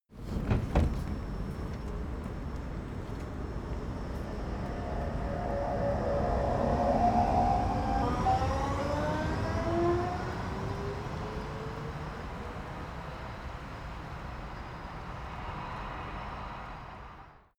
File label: train subway old depart; bahnverkehr u bahn